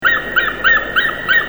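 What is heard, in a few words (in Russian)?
тревожный крик летящей над головой хищной птицы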